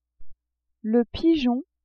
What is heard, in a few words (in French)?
pigeon, animaux